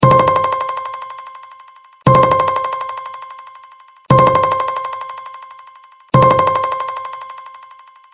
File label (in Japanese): 本体アラーム×4